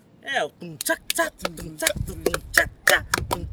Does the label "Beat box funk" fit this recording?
Yes